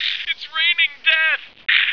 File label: raining death; Binder; Arkade